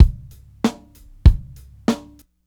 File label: Straight uptowon, Hip-Hop, Bonus Loops; Sonic Foundry (Sony Creative Software); Hip-Hop Street Beats Construction Kit; Street Beats by Poogie Bell